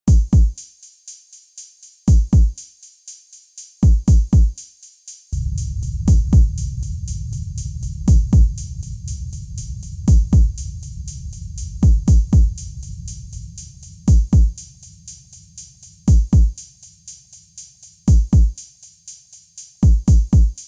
accumulate evidence, only bass

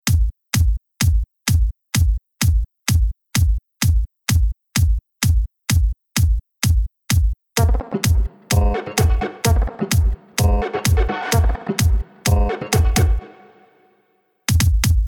electro, off melody

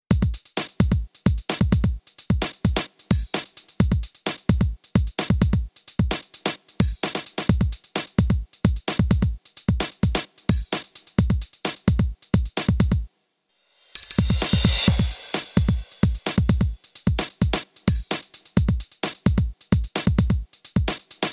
Information only bass

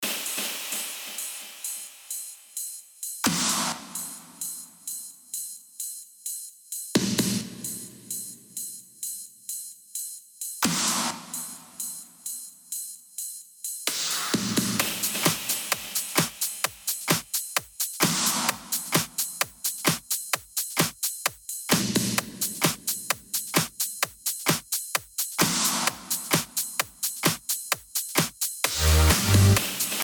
Drum FX

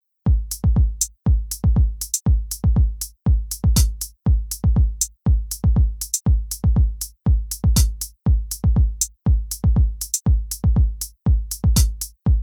only bass

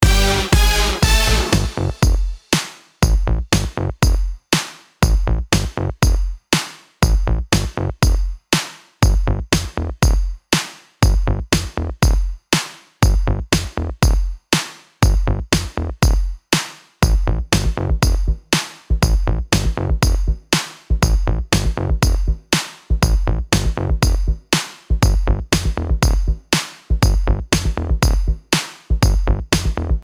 Geinou Goisu-A 120

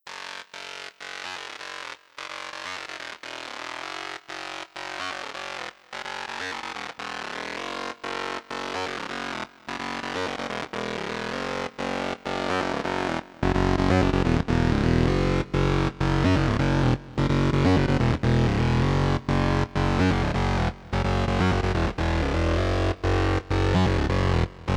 only melody

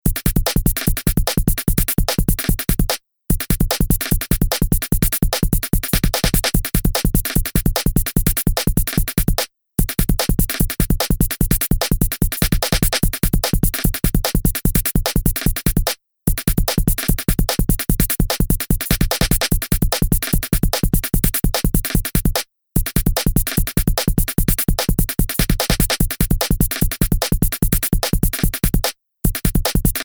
only bass

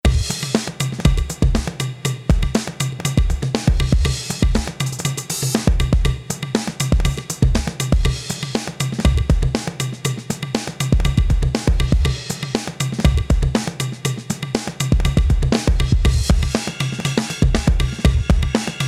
Only Bass, funk

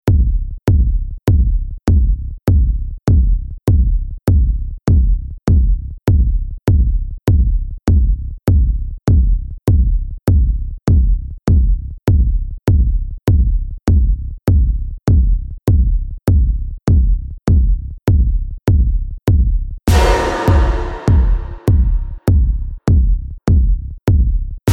encounter, only bass

